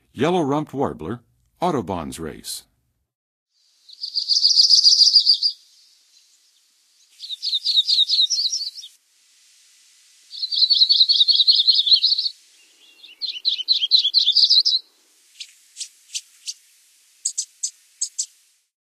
Yellow-rumped Warbler Audubon, Songs of Birds of North America; Western USA; Western USA Songbirds